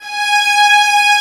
Vla G#4; Digital Sound Factory Virtuoso

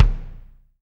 BD, DR BD; Best Service Gigapack 2

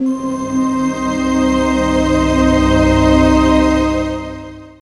C, Pads; Zero-G; Total Drum Bass

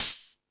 High Hat, Drum Machines E-MU Pro-Cussion

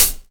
HH OPEN; USB Soundscan vol.27; Ragga & Dub Reggae; AKAI

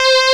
bend C4; Analogon

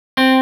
GUITAR 130 BPM delay; SONIC ELEMNT; SONIC EL YES

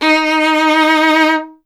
STR VLN, Vln Detache; Miroslav Vitous

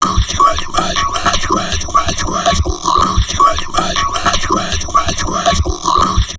TALK 160, Drum Loops Crazy Processed

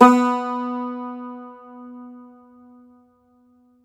Cumbus SAZ B, Plucked Strings; E-MU Producer Series Vol. 7 – Old World Instruments

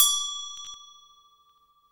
GLOCKE, GLOCKEN; SoundBytes - Early Patches

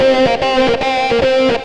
xtra lead

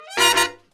DBL, Booty Brass; E-MU Formula 4000 Series Vol. 1; Hip Hop Nation

